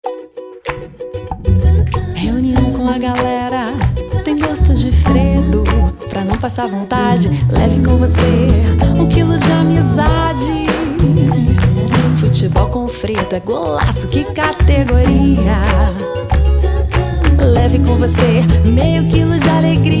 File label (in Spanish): Solo Canto; Freddo